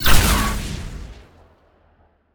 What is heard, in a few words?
weapon missile; weapons; starruler